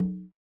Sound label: RX8 Cga LO, Yamaha RX-8